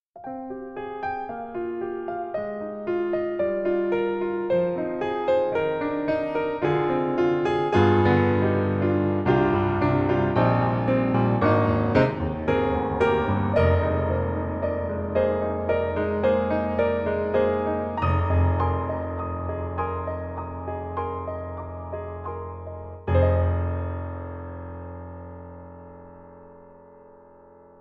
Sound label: Big Concert Hall